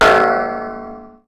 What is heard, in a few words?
error